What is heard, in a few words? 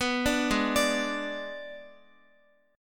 Ab Diminished Ab Guitar; Abdim arpeggiated; JGuitar